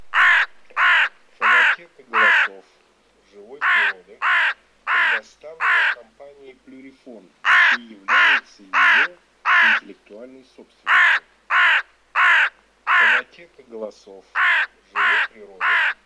Черная Серая ворона Cornacchia

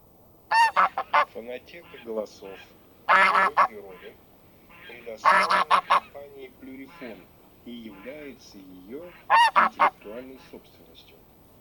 Гусь серый Oca Selvatica; plurifon

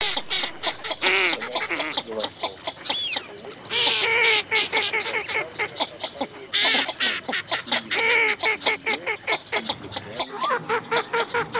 Широконоска Mestolone; plurifon

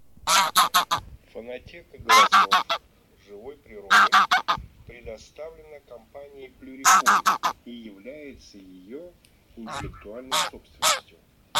Гусь серый Oca Selvatica; plurifon

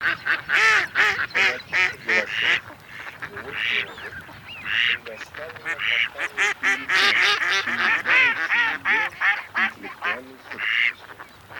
Кряква Germano; plurifon

